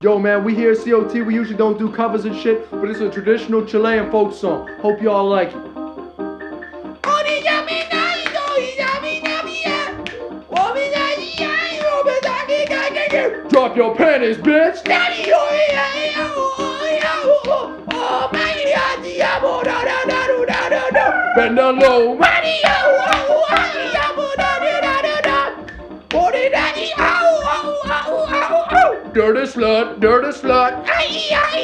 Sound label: Chilean Folk Song